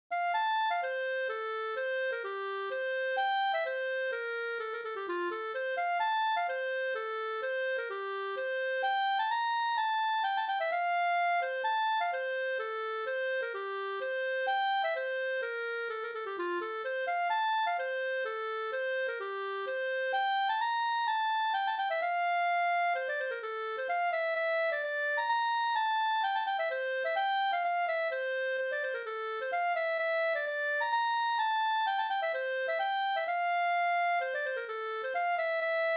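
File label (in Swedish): polska Bodapolska, F major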